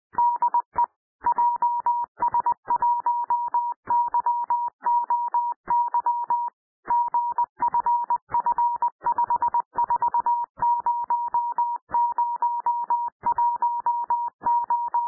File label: cw hk beacon; アマチュア無線